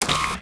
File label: catwalk Switch deny; interface